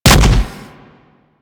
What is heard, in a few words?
Impact Flesh, Weapons; Warframe; Furax